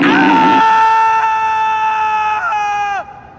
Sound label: aaaaaaaaaaaaaaahhhhh